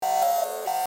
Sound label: digital siren alarm loop